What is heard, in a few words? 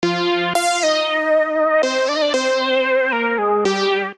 Lead (F) 115bpm; Music Loops; Radio Lead; Ableton; Factory Packs; Singularities